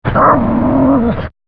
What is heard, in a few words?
growl dog real